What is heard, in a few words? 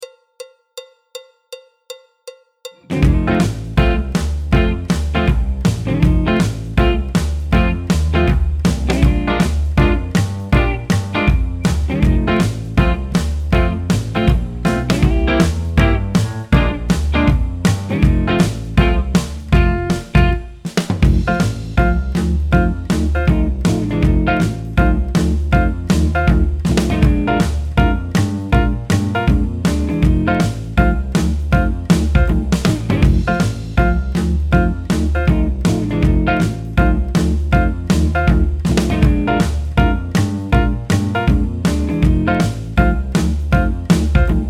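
backing track, Jazz; alto, tenor, trumpet, trombone, piano; Jazz Syllabus Level 1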